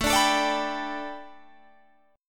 A 7th Suspended 2nd Suspended 4th Guitar Half Step Down